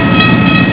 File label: bell